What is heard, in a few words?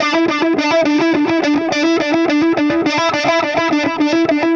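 Delay Wah, Guitar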